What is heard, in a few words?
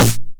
909 SD Tape Sat